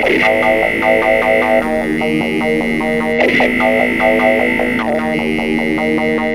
Nord Loop, Keyboards

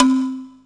Halimba B, Pecussion Hits; Zero-G Jungle Warfare 1, 2 & 3; 90 s Sample CDs

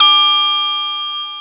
RINGMODULAR, ROLAND MKS-80; Rubber Chicken Software Sample Bank

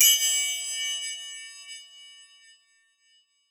Acc Cym; Roland SPD-S

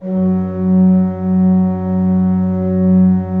F2, Cathedral Organ; ILIO; Propeller Islands

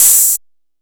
kit, Yamaha Motif-ES8

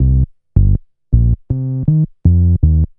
Bass, Futurist Drum & Bass

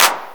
Handclp; Giga Samples Collection; 909 Clubkit; Bass

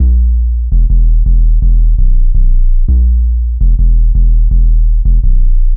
Hard 808, Bass Lines 166.5; Jungle Warfare 1&2

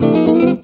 EGUIT CHORDS; Best Service; World Colours

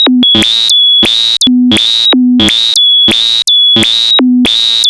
ALARMING, SCI FI ALARMS; Ambient; Zero-G; Ambient 1

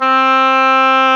oboe, Roland Orchestral Winds; Roland LCDP04 Orchestral Winds; Oboe Brth 1

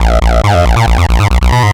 bass 138 a#, bass-licks, Techno; instrument-loops

